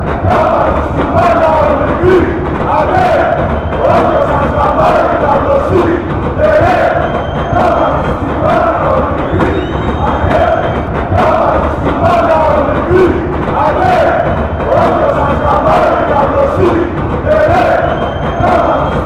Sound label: olympiakos chant